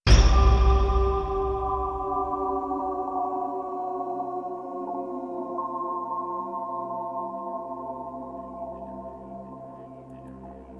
DOWN SAMPLE Bb

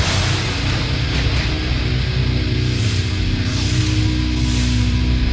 guitar 90 B

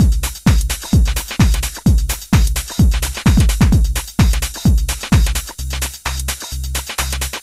drumloop 129

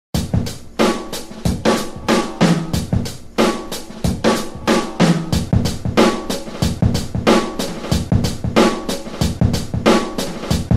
Loop Ultimate Break Beat; drumbreaks

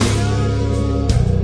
guitar

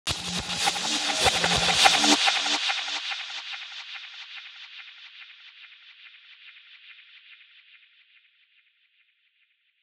beat

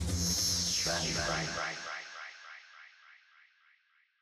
bang bang

